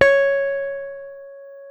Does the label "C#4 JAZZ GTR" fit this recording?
Yes